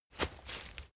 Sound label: step leaves wet single; schritte